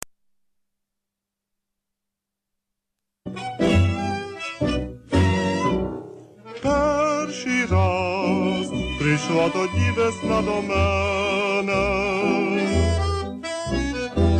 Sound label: Ukrainian tango